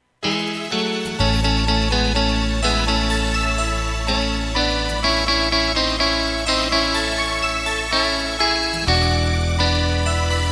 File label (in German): Playback Lyrics; midifile